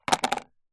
food candy bubblegum tape plastic tub set down

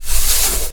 medieval scythian recurve arrow draw; still north media

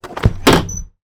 transportation van delivery chiller door open; sound effects one

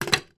toy lego digger put down on wooden table

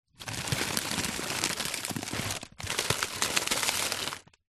household freezer items movement; zapsplat

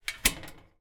household bbq metal lid clip

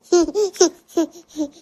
vox 10 month baby excited chuckle laugh

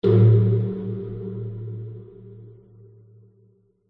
sound design hit metallic dark horror reverb; zapsplat

